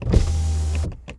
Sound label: servo window; soundmorph